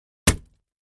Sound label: Axe Paneling 1 Side, axe hits